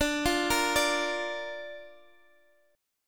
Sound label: D Minor Sharp 5th D Guitar Open D